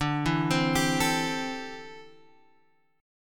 D 7th Suspended 2nd Suspended 4th D, Guitar Open F; D7sus2sus4; arpeggiated; 14,11,12,14,12,x